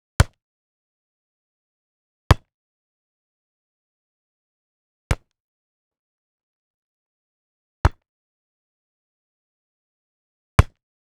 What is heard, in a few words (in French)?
frappes ballon